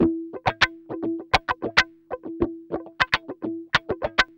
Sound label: GUI, LICKS